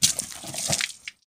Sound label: splash; water splash slime sfx